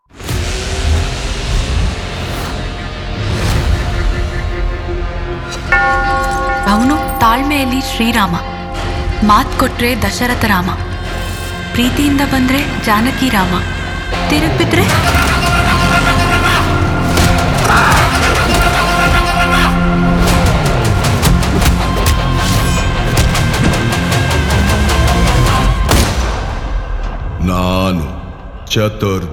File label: Theme Music